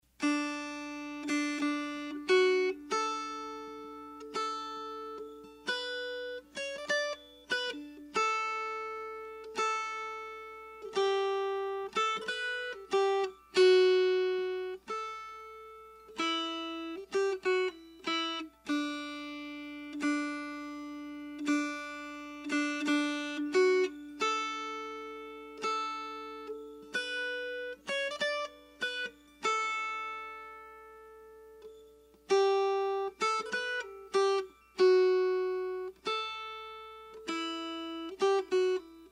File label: Melody